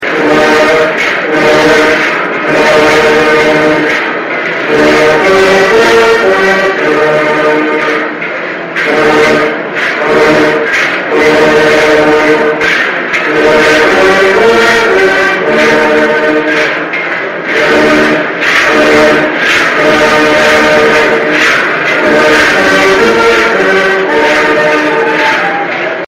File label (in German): klingelton